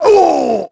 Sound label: GRUNT; NIXON; Pulse Racer